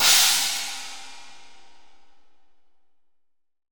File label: 22 CHINA; 22INCH CHINA